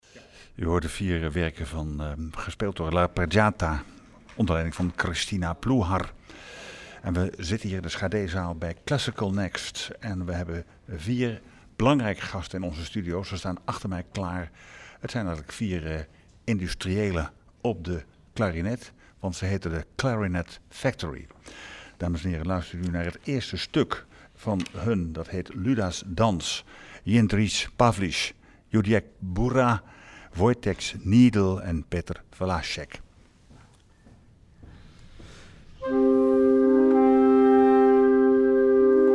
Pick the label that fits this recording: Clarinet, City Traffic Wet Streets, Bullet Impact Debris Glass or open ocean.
Clarinet